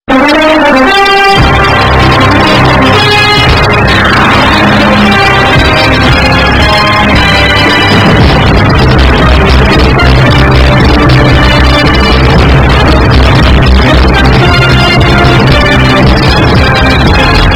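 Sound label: nfl theme bass boosted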